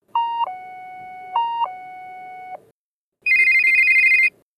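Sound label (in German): TF04 innenstation 2 töne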